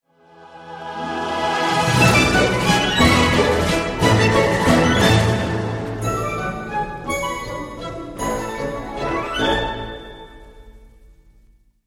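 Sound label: sms mix on nokia funny mixed sms ringtone; sms mix na nokia prikolnyy miksovanyy rington sms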